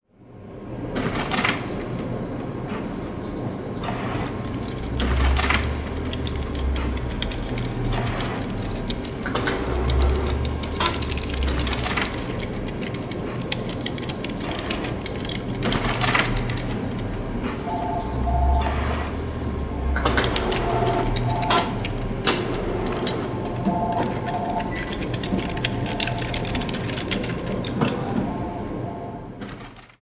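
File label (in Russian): звук офисной среды компании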